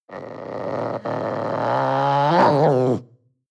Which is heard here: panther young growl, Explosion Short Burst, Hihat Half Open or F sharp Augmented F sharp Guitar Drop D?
panther young growl